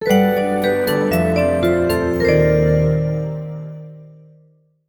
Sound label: Result Lose; the king of fighters for girls; android